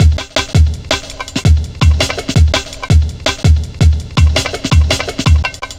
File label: Drum Loops, 165.5, Jungle; Zero-G Jungle Warfare 1, 2 & 3